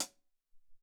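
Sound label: Closed Hat, Alesis SR-18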